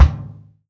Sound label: Tom Low